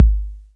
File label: BD 808 Tape Decay